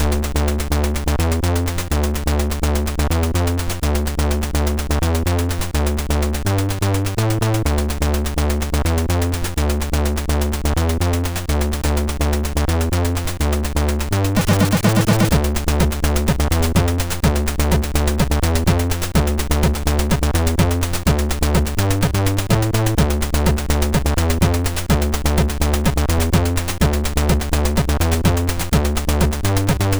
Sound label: Remix, MOS6581R3; Budbrain; hvsc